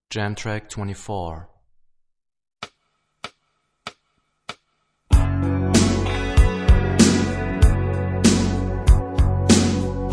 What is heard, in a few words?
Rock guitar; Rock gt Secrets